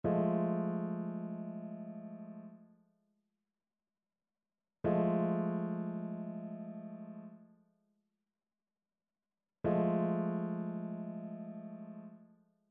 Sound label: trichord; trichord identification